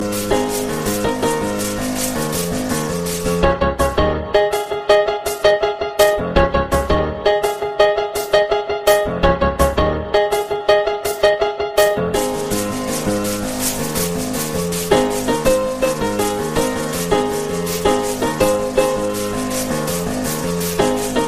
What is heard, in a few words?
Samsung Galaxy M52 Ringtone